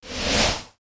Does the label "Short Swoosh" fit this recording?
Yes